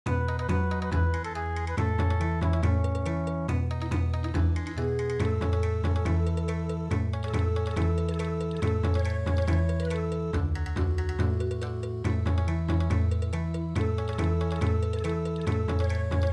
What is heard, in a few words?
Bon Odori